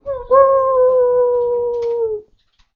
moo, tuba

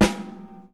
snare single

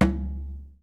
big conga

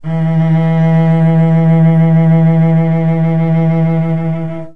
low E, cello

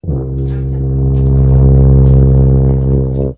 builds drops, tuba

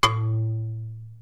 marimba E, pitched percussion

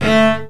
short strum, cello

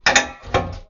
click thump, tuba

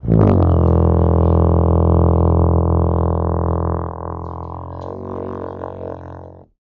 long and low, tuba

